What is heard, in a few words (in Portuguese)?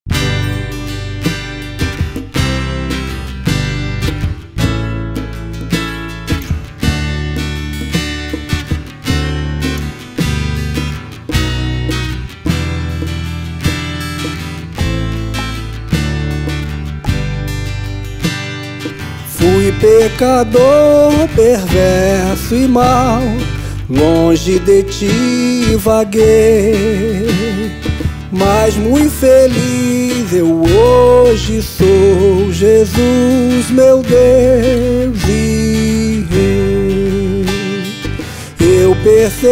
hino da harpa crista; sou mui feliz